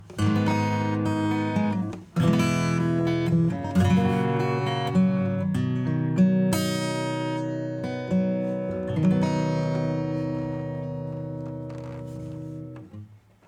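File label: Compressor acoustic Guitar Width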